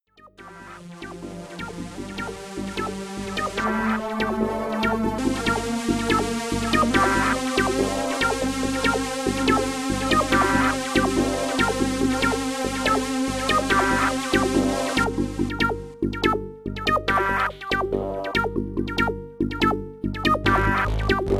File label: synthetic live improv; synthetic zen; live broadcast improv test